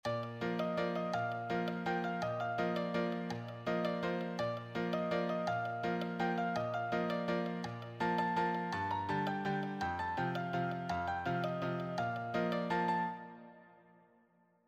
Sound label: solo, piano